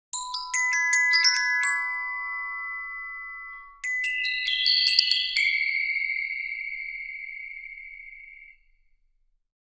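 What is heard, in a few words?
Glockenspiel, PNS above 2 kHz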